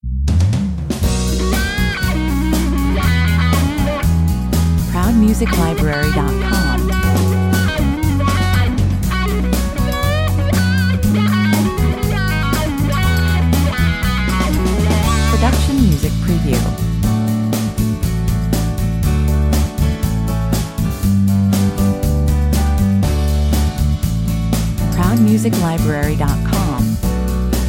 120 bpm, Care free, easy, upbeat rock track,positive mood featuring very determined; Care free, easy, upbeat rock track,positive mood featuring very determined solo rock guitar licks, rock drums, bass, and piano